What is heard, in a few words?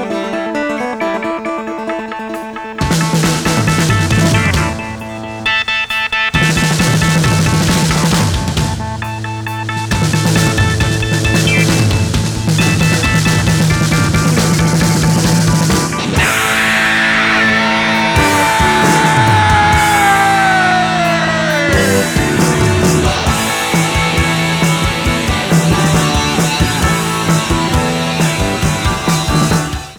Drum Solo; Live In Las Vegas 1999